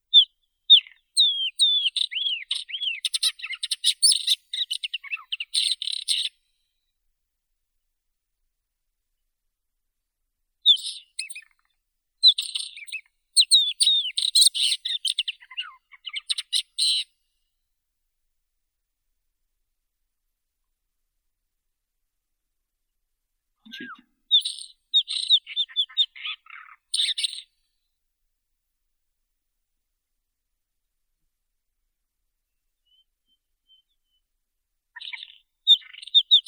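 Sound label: isabelline wheatear, Oenanthe isabellina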